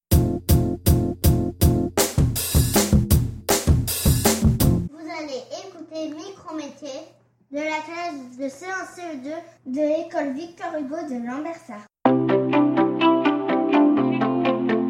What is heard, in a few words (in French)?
JINGLE